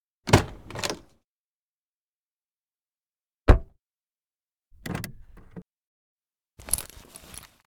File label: Car interior foley door lock keys handling